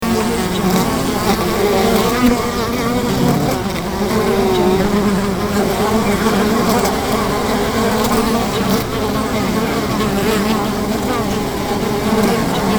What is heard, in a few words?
bee hive